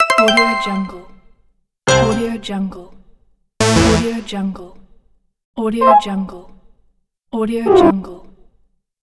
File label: android menu pack